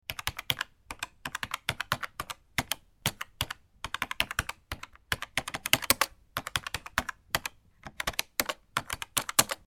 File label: Aggressive keyboard typing sound effect